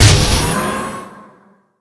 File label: porto create